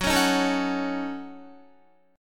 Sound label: Gb Minor 6th Gb down Guitar; Gbm6; strummed